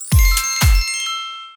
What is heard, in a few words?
win; Amatic; Fruit Box